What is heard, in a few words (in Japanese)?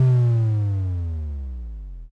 zuun